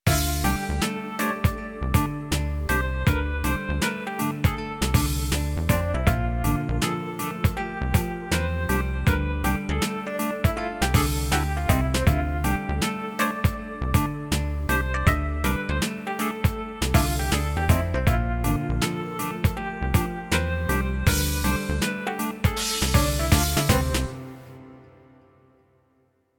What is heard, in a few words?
pad